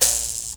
MOBO HI, MOUTHBOW, Heart of Africa

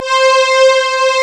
WARM B C4, D50; D50 STORY; East West; Global Sounds

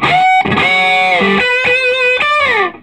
SOLO, Vintage Blues Guitar; USB Soundscan vol.22

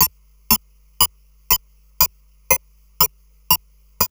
Drum Loops Crazy Processed

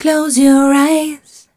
f mel e flat, fem Vocals

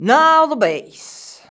VOX VOX; Sound & Vision - Gigapack II CD 2

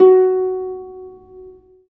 STR HARP, STR Orchestral

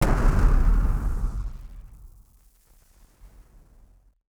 Party Popper FX

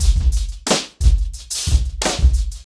90BPM, acoustic drums; The Roots Drumloops

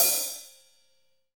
HH, Kit; Real-Kit

